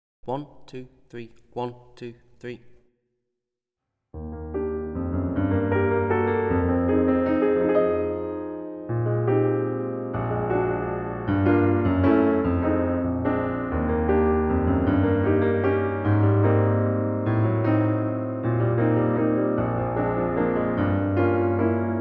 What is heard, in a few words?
valse accompaniment